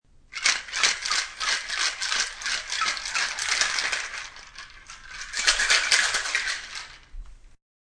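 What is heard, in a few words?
Ice Shaker Double, Bartender shakes ice and drink in metal ice shaker; Ice Shaker Slow then Fast; Drink Mixing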